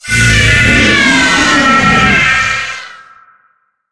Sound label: spider victory